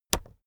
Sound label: keyboard external space push heavy